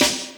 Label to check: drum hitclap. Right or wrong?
Right